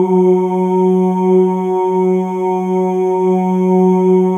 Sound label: MALE OOS, Symphony of Voices; Spectrasonics